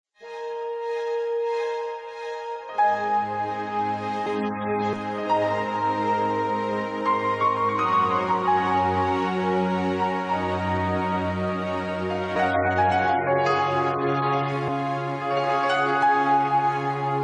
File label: ORCHESTRAL